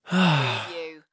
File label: Sigh Content Male; sound effects bible